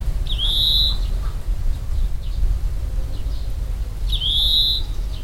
oriental greenfinch; birds of china

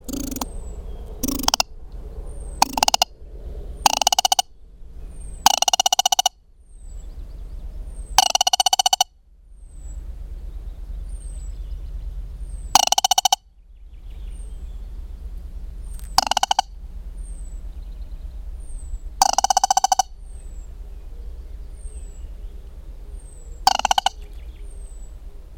crake band bellied Porzana paykullii

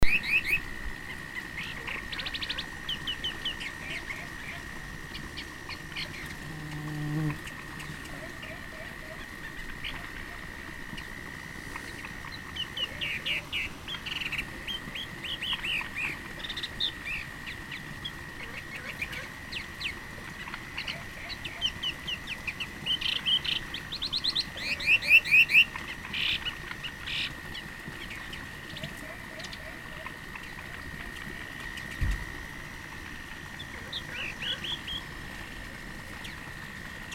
fulvetta dusky Alcippe brunnea

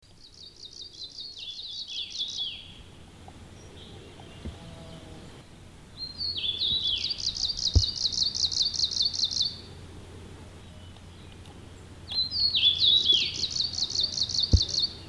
Kamchaka Leaf Warbler; Christoph Zöckler

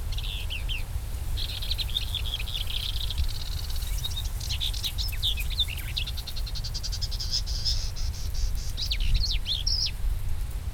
warbler paddyfield Acrocephalus agricola

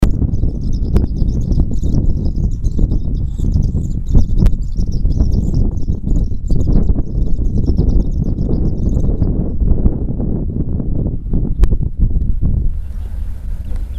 pipit pechora Anthus gustavi

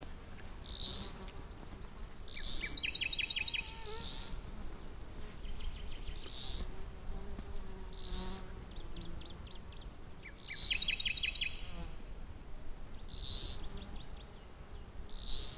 warbler baikal bush Locustella davidi; Craig Brelsford

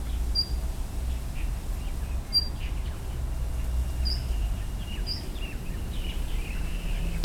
warbler sakhalin leaf Phylloscopus borealoides

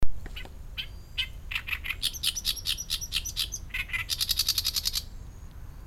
warbler oriental reed Acrocephalus orientalis

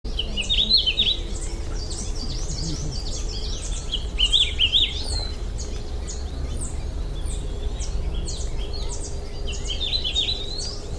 warbler bianchis Seicercus valentini

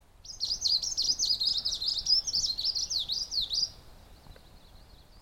warbler two-barred Phylloscopus plumbeitarsus

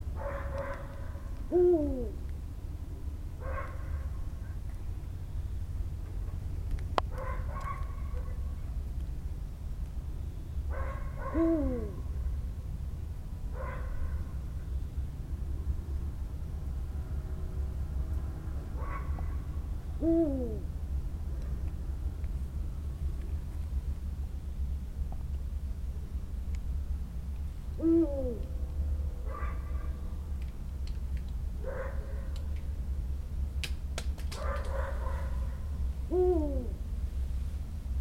eagle owl eurasian Bubo bubo

